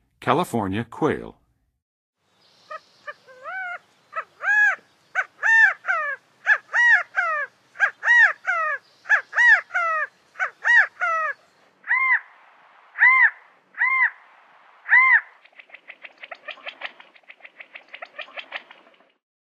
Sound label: California Quail, Birds of North America; Western USA; Western USA Songbirds